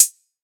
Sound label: hot hi hat c key, Royality free high-hat tuned to the C note. Loudest frequency: 7927Hz; hat samples